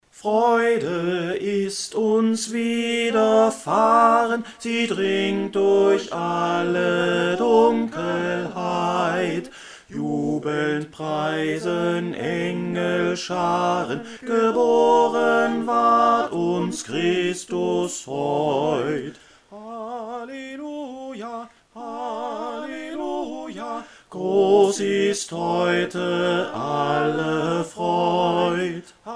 wjubel bass

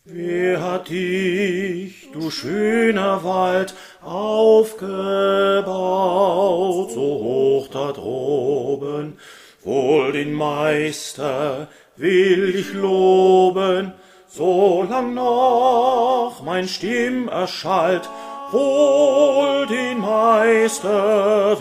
bass; JAEGER-ABSCHIED

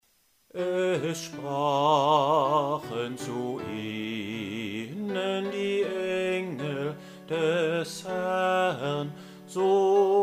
bass

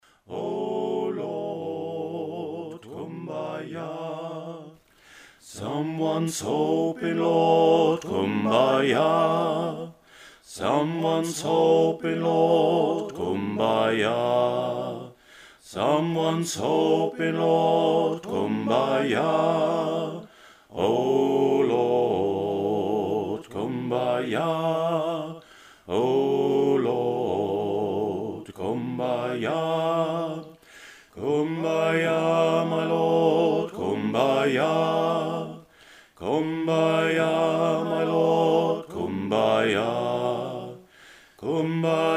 kumbaya bass

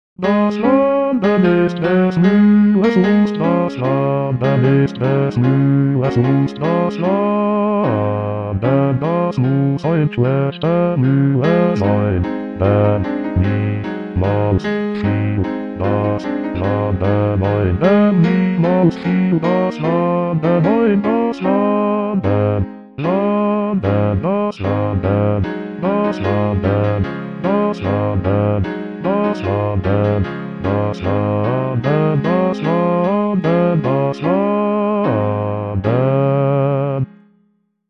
wander müllers lust bass

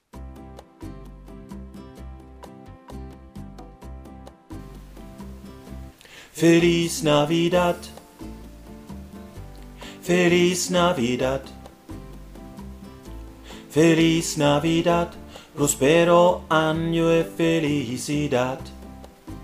bass

